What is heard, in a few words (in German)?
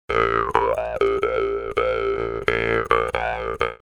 Maultrommel